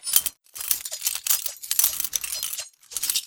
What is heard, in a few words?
inspect; custom weapon; k31; octane